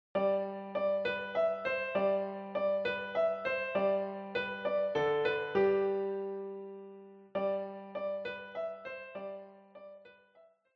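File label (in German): Kommet ihr Hirten, Klavier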